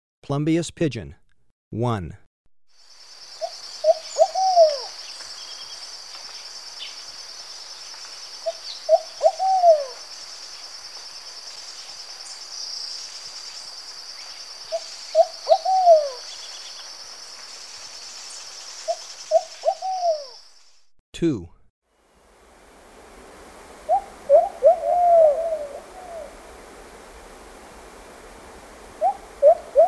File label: Plumbeous Pigeon; S. America